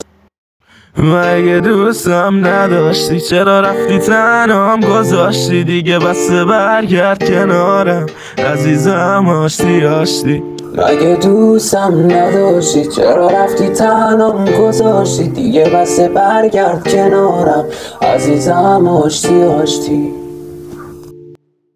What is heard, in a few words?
Guitar